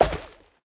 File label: Carrot pickup, 拔掉胡萝卜音效; 技能音效 pickup; 梅维斯; 卡通农场